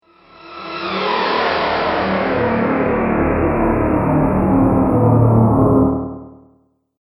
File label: Flashback Whoosh; Timewarp Twins; Whoosh Sound Effects